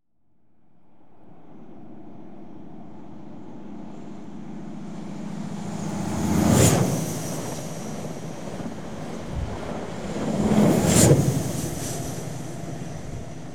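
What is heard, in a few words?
Trains, Midland Electric, Exterior; Transportation; BBC Sound Effects Library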